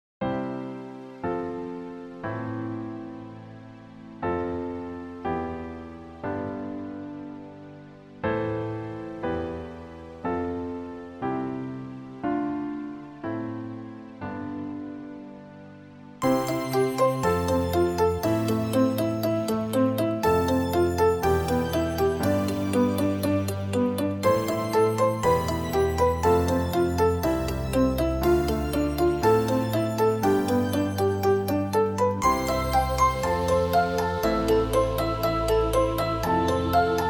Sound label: Success, music